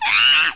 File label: chimp scream